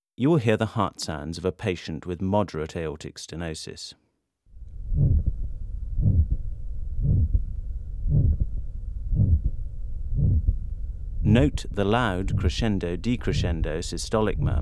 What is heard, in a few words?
Aortic Stenosis, Heart Sounds; Breath-Heart-Sounds